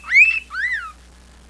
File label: wolf whistle